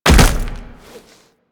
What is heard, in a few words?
Land Heavy Ice, Footsteps; Warframe; Tenno